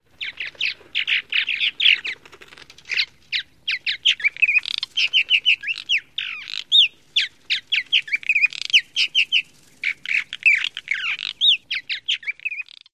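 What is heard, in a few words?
purple martin various calls; AZ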